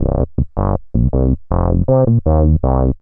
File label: Acid Bass